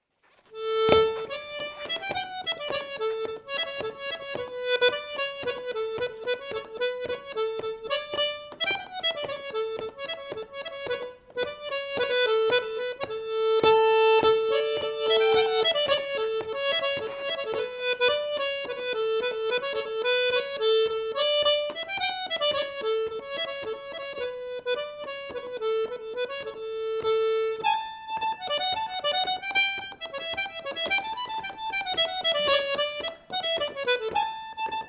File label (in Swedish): Polska; Pinnin